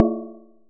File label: impact Bell heavy; Kenney's Sound Pack; Impact Sounds